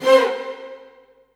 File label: Synths C; Vengeance Samples